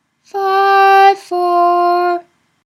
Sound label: weekday minor pausal, nusach